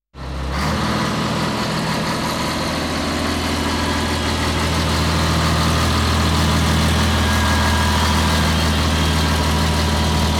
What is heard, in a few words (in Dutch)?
Hijskraan Aan het werk; KMP Film Video Sound Effects